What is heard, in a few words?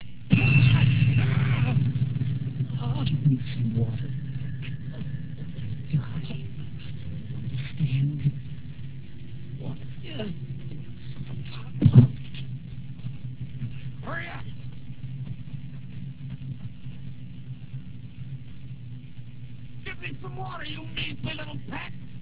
water; "Give me some water, peck. Or you die."